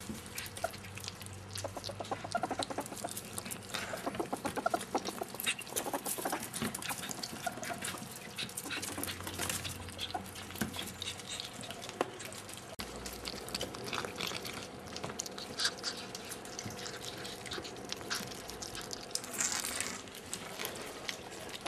seskes mamos motinos gugavimas, ferret

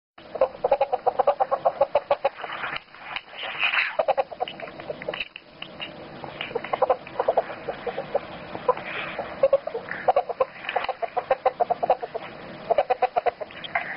gugavimo pavyzdziai vienas

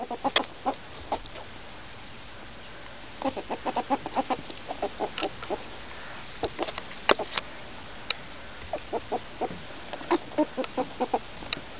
gugavimo pavyzdziai su pliusiniu zaisliuku, ferret

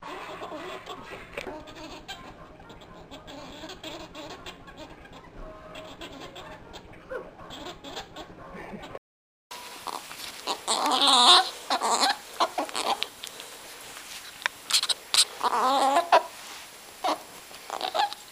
misrus garsai, ferret

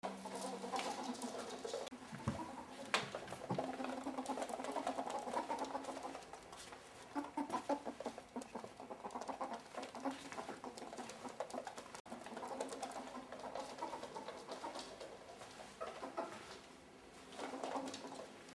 gugavimo pavyzdziai rujojanti patele

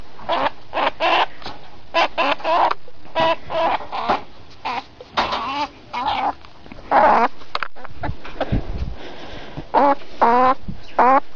mazi glostomas, ferret

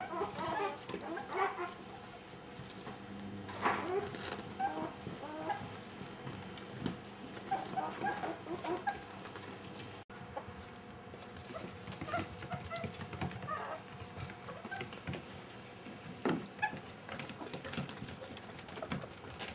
kalba seskiukai zaidzia

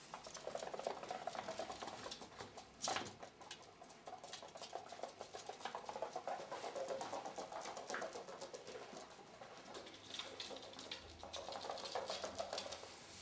begimas laminatu, ferret; nesusije su balsu

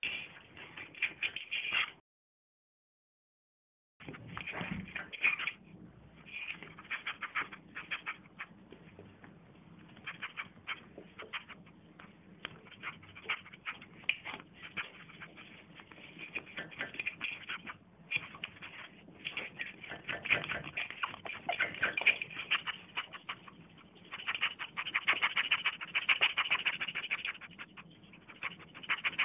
keisti garsai garsas, ferret

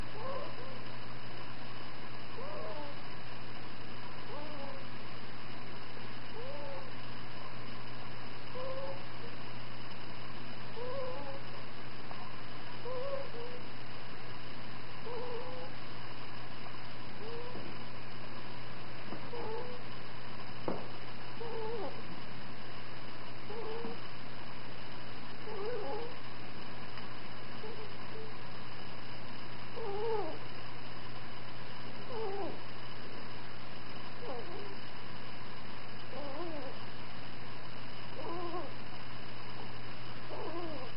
knarkimas knarkia, ferret

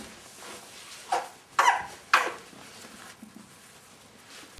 kosejimas ciaudejimas kosti

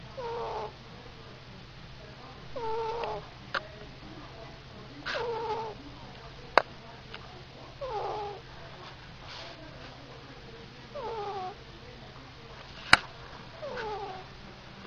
knarkimas knarkia, ferret

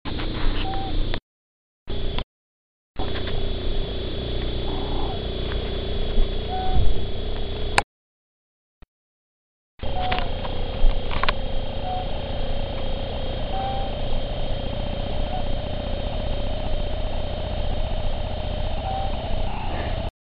knarkimas knarkia, ferret

